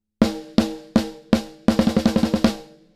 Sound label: snare; Lindell 50 Series